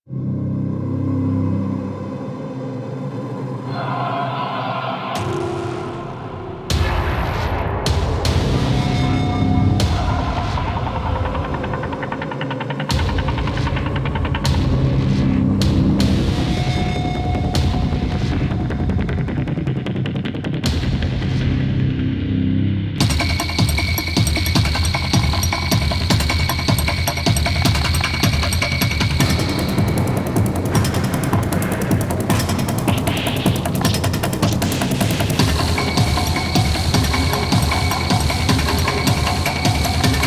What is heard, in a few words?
Cinematics Music; Warframe; New War